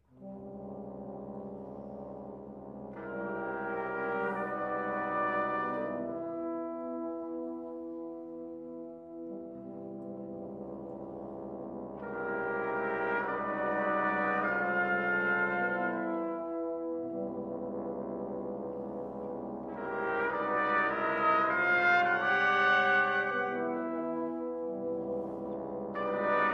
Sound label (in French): ensemble du cuivre, simulation instrumentale; Épilogues pour ensemble du cuivre